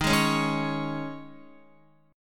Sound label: D sharp 7th Suspended 4th, Guitar; D#7sus4 strummed